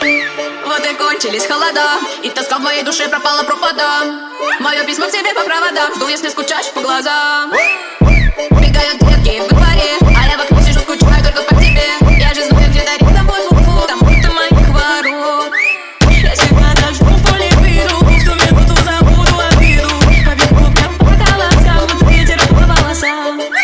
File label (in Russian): phonk rington